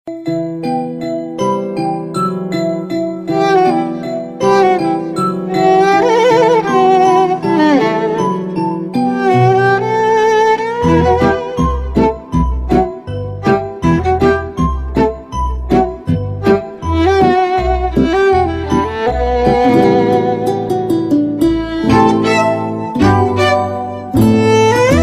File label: Romantic Ad Music